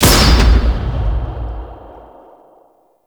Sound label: Impale Proj Explosion; Warframe; Warframes; Hoplite